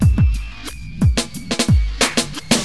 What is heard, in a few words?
Drums loop, HipHop; LiveAct Pool 1